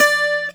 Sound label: C GTR HARD D4, Celtic Flavours; USB Soundscan vol.42; AKAI